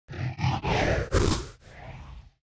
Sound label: Rock Throw Grab FLAME; Evolve; Meteor Goliath